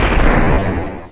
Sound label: bash it; Total Chaos Nukem 3d Pack; duke